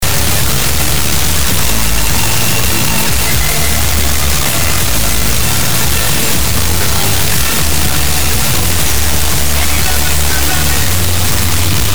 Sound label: splatter killer test f106.5MHz bw140kHz off; splatter killer for wideband fm